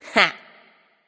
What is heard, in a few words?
hehheh; smite; pele